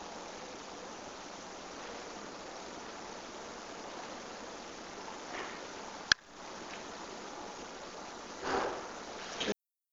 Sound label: evp click